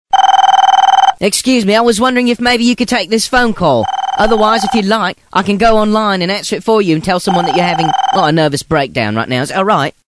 nervous breakdown

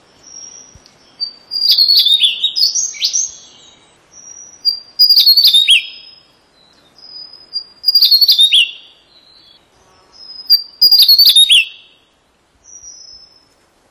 tesia jawa

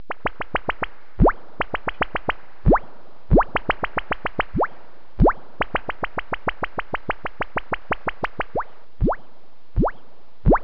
scifi

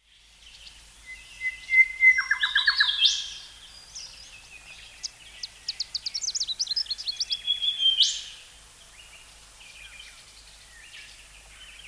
dawn chorus

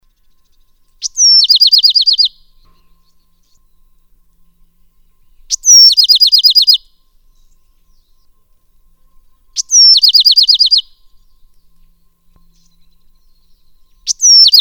bewicks wren

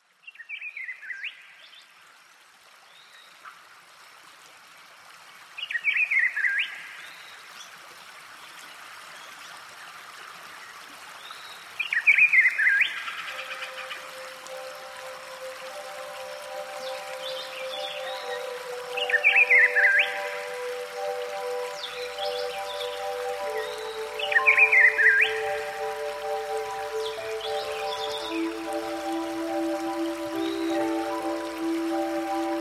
forest sojourn

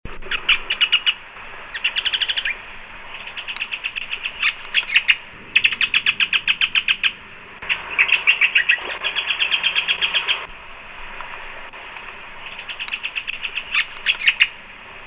audio lichmera argentauris argentauris

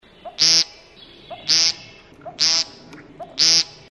woodcock

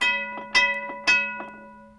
musical

